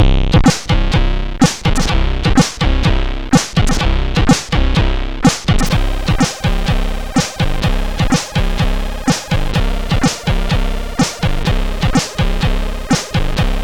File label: sid CSG 8580R5, C64; Sex Drugs and C64